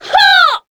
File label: succubus shout long; queen girl